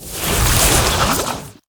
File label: Slime Tank Attack